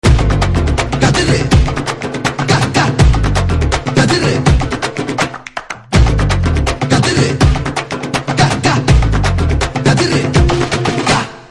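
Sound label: background music Ringtone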